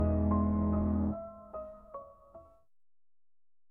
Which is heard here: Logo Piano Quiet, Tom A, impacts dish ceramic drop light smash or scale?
Logo Piano Quiet